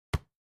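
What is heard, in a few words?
Football Kick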